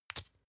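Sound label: toothpaste close bright, Bathroom; haus-garten; badezimmer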